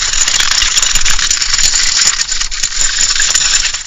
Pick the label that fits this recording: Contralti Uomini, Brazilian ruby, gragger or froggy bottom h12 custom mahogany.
gragger